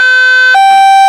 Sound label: hilo, sirens; canada